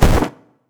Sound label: wings out; deltarune yellow translation